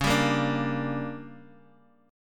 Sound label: D Major 7th Suspended 4th Sharp 5th, chord